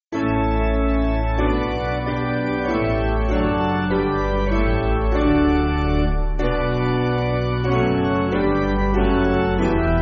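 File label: Piano, church backing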